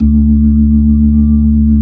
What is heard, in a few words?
B-3 D 3, Jazz; Keyboards of The 60's and 70's